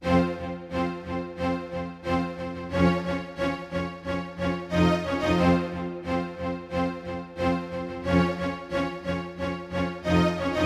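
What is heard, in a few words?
Hip Hop Rhythm Strings, Fmin, 090 bpm; Pro Tools Essential Loops; Digidesign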